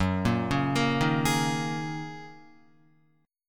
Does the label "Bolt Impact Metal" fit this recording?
No